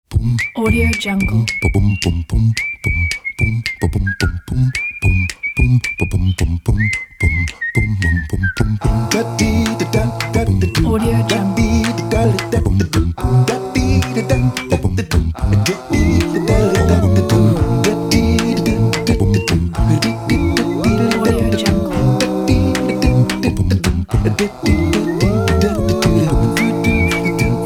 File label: feel good acapella